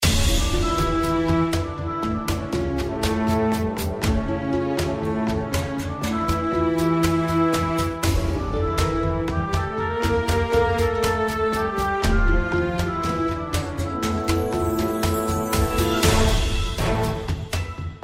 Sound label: bgm; slots; sRoma